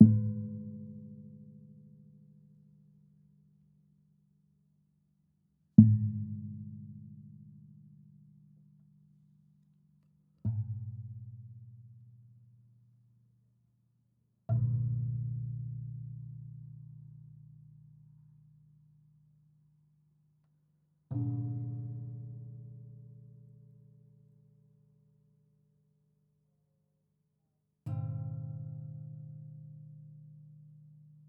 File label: Marimba Hits Etc